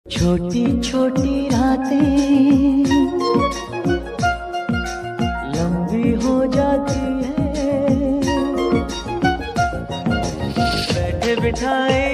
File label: male hindi ringtone